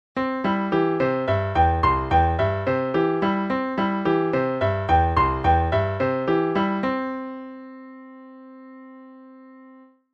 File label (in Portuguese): arpejo